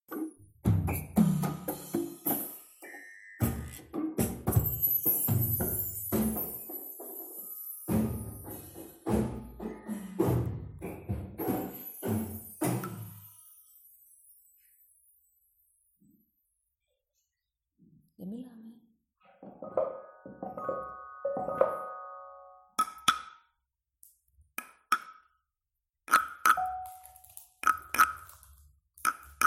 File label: triplet on synths chaos